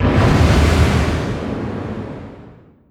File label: distance ship miss small effect; Hearts of Iron IV Death or Dishonor